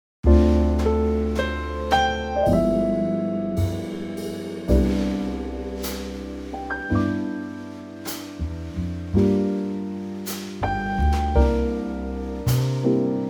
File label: C TRIO; key - C - vocal range - B to D